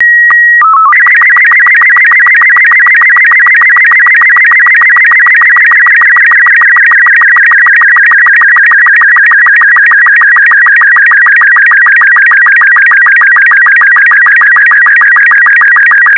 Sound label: SSTV Robot36